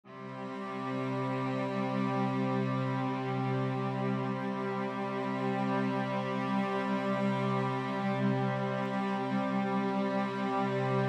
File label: 5th in C, String Ensemble